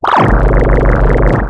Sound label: buble